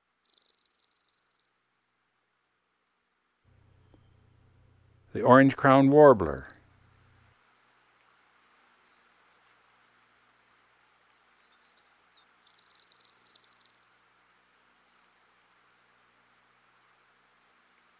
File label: orange crowned warbler; dan gibsons solitudes